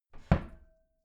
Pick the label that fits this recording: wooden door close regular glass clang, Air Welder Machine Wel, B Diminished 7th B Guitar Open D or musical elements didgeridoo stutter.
wooden door close regular glass clang